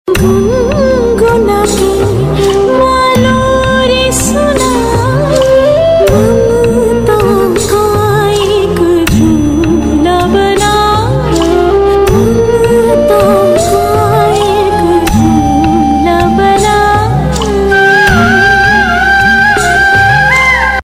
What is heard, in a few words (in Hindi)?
Gun Gunake Maa Lori; TV Serial Tone; Sony; Suryaputra Karn